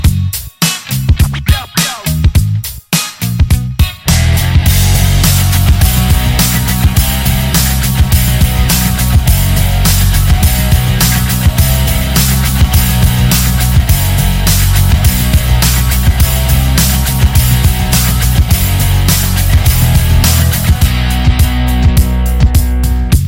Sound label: ringtone